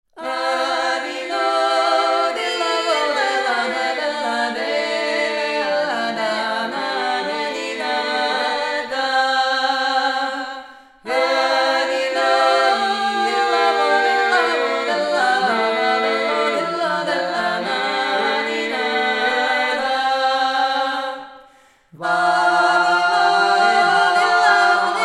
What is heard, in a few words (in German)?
alle Stimmen